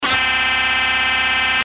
闹钟; 音MAD; 蓝蓝路

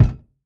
拍枪 Kick; 刘醒

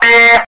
bi A#5, 喇叭

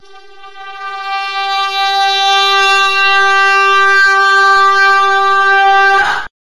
啊 G4 middle; 真夏夜的淫梦

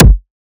Kick (拍桌); 柯文哲

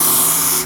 铅笔; 音MAD; 你的名字; taki

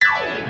开头钢琴

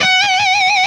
电吉他 F#6; 音MAD; 孤独摇滚

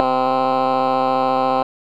美声 C4

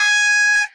尖叫 G#5; 音MAD; 街角魔族

